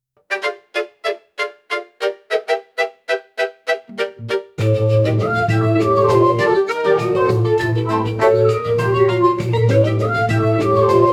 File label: folk adventure music with, tavern